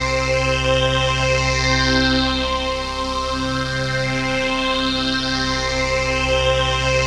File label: PHASE PAD, ATMO PADS; Best Service; XX Large